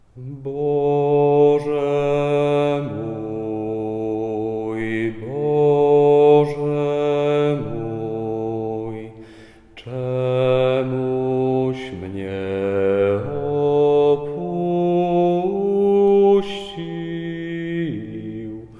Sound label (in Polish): bas; Wielki Czwartek; spiew na triduum